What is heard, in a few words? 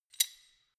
wrench open; schrauben drehen hydraulik